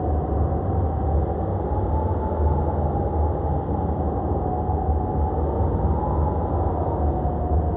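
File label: ambience cave entrance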